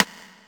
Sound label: click